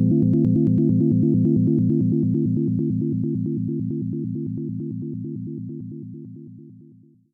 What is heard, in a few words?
SEQUENCE Linear